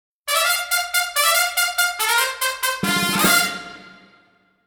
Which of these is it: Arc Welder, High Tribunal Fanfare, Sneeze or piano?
High Tribunal Fanfare